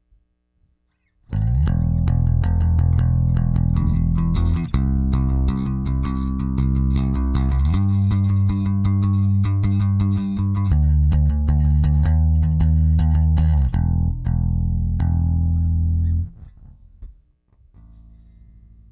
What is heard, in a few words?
Bass